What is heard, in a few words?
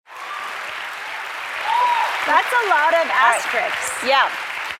LAUGHTER